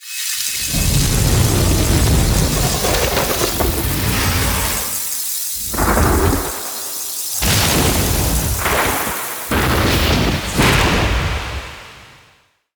Planet Accents Two; Narmer Outpost

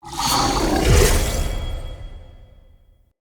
Stag Energy Drain Cast; Powers; Stag Pact

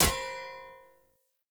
Short Sword Medium Impact Metal

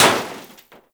Scooter Impact; Warframe; Ambience; Nineteen Ninety Nine

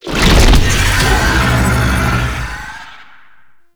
Doom Stack; Warframe; ManInTheWall; Octopede

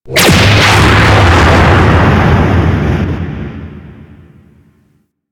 Mine Projectile Explosion

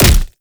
Punch; Warframe; Fulmin Prime

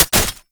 Mech, Weapons; Warframe; Braton

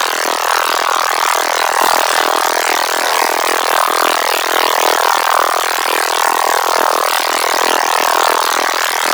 Ice Weapon Beam Loop; Warframe; Corpus; Corpus Railjack; Spec Ops Ice Moa

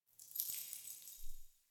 Idle Head Shake; Tagfer

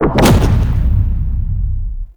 Heart Vault Pulse; Cephalon Crypt Puzzle

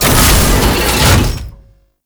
Tank Turn Half; Warframe; Lasrian; LasrianTank; Movement

